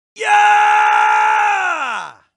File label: scream buff; arkantos; medieval raid; zombiesurvival